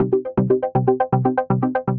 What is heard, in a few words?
Hypno Arp; Synth Rhythmic; Ableton; Factory Packs; Punch and Tilt